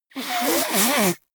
nose blow

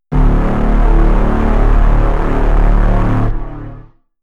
synth trailer hit, hits impacts; whooshes rises

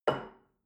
shell upside down place on table soft short; berufe; gastronomie; soundpool

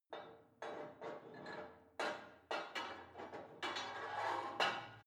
put weight onto barbell unsteady; sport freizeit; fitnessstudio

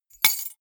key ring large drop stone hard

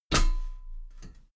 step metal ladder step top heavy

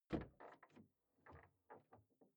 car door open exterior hummer h3

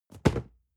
cardboard box medium fall low; objekte

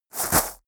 herb drop; industrie wirtschaft; bauernhof

